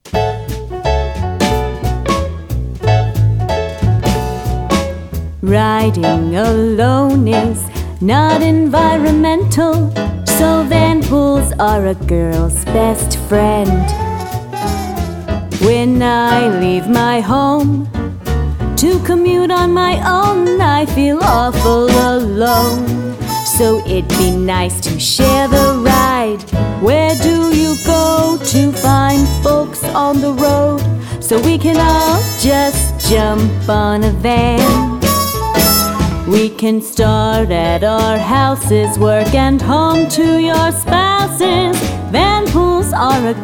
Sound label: jingle